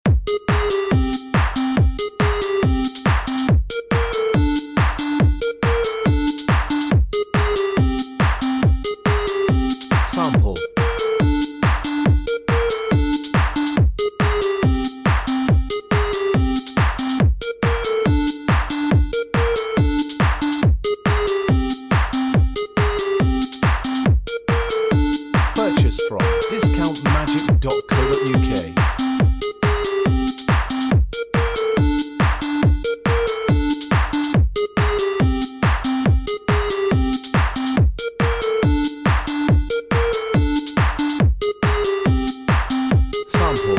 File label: techno, backing